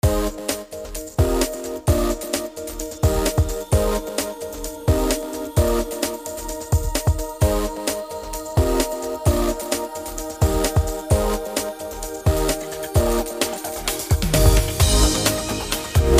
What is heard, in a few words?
oceanside park instrumental